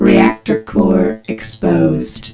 reactor

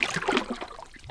wade

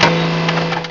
jump; vortex; smasher

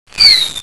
whistle echo

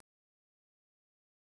step; openffa

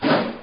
jump

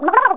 gurp; Lemming

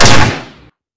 fire; lmctf

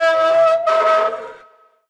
valve; rogue

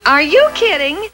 pain; Wilma

